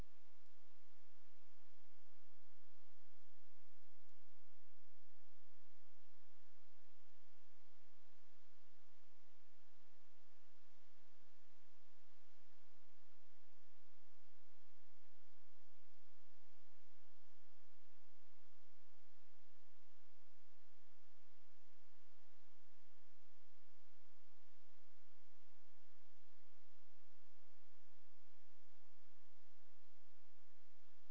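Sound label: hydrophone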